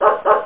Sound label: puppy